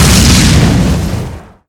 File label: combustion